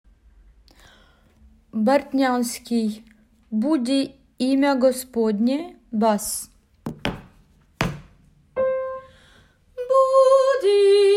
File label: basses, boudi imya gospodne